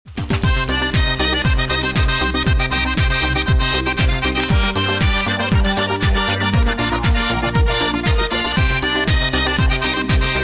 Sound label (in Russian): instrumental